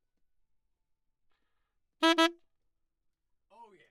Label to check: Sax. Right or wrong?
Right